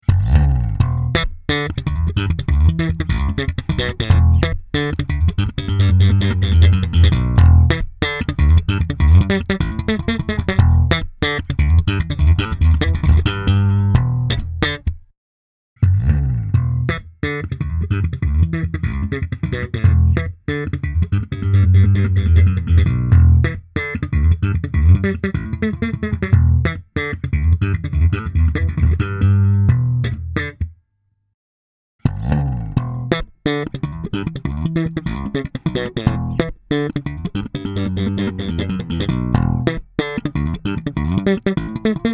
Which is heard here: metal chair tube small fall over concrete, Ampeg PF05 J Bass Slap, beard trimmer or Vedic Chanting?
Ampeg PF05 J Bass Slap